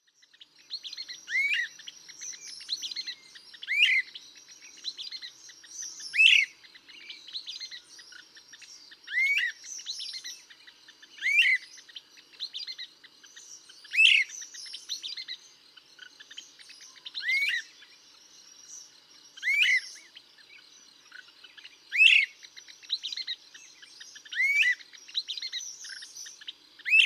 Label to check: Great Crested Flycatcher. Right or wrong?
Right